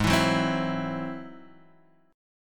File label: G sharp Augmented 7th G sharp, down, Guitar, Drop D